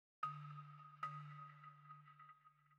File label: Balafon